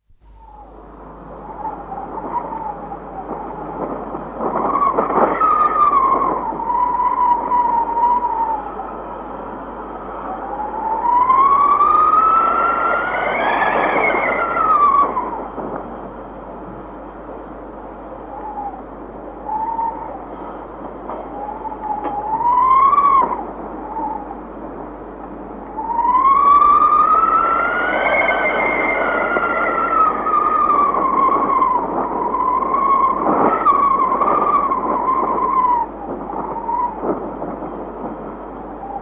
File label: Wind whistling through a crack; BBC